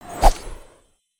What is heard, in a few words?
Whoosh